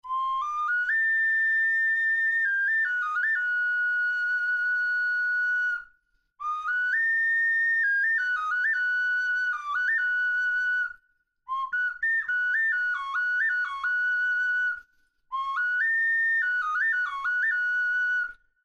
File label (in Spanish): ocarina tipo inglesa